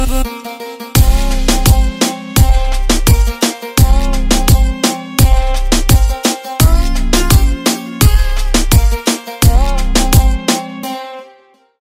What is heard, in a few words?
Background Music